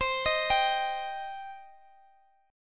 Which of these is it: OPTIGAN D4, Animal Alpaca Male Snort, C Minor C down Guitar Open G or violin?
C Minor C down Guitar Open G